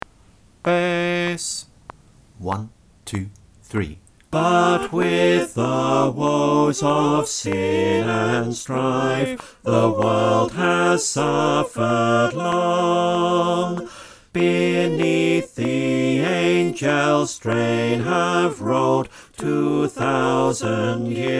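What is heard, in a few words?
bass click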